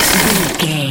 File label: gun auto barrel spin med stop